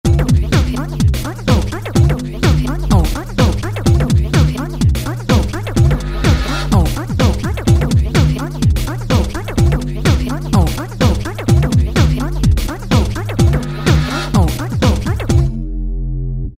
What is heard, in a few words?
Tribal House, Korg ES-1; Factory Patterns